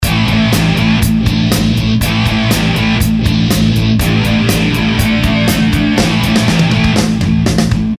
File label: Hard Rock